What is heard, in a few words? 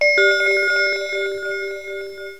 Ice Bell